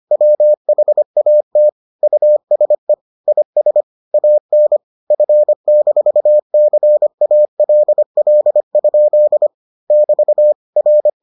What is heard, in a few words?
morse